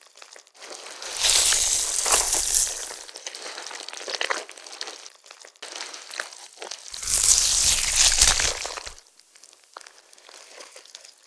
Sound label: worm idle, giant worm